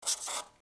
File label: marker